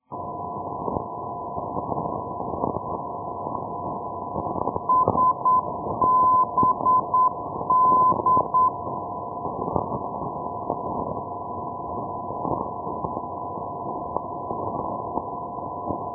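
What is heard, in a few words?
ndb, non-directional beacon; sabadell sbd; europe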